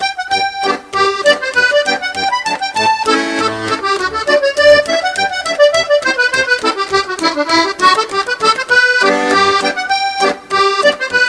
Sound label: hornpipe